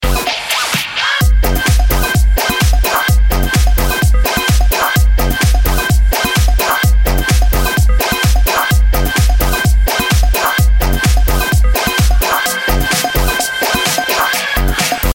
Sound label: Bleep